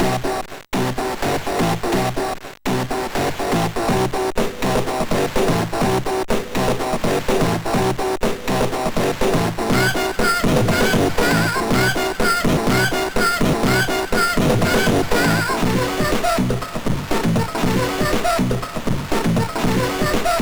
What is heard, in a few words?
Techno Mix, sid MOS6581R3; Dooshek